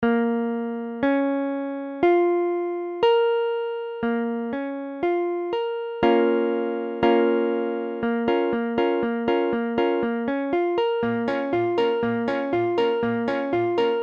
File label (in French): Bbm, guitare